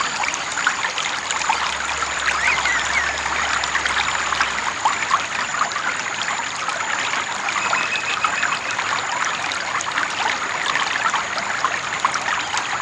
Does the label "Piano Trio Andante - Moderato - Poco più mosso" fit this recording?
No